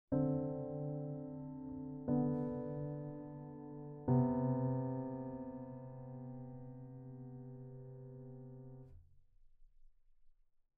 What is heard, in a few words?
superwide ii Vs left hand